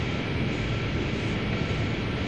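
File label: machinery; Kingpin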